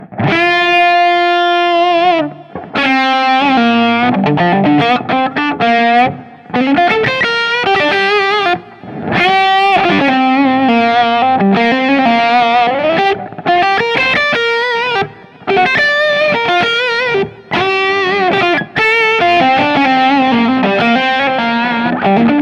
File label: delay; Gain 9; Warm Treble 12; PPIMV 17; VVR 17